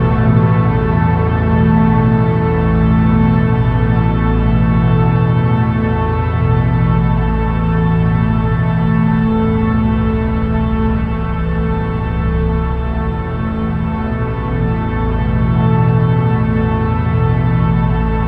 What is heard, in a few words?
DEEP STRING